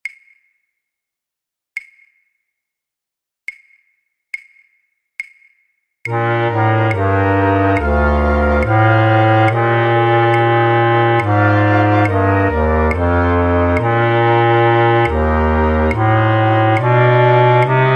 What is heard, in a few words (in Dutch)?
Matthaus Passion fluitorkest Contrabasfluit; voor oefentracks